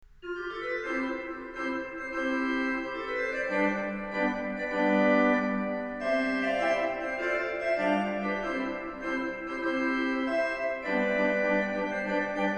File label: Three Flute Clocks